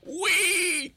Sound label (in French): cochon